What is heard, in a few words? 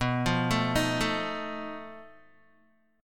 B 7th Flat 5th B Guitar; 6,8,9,8,9,x; arpeggiated; JGuitar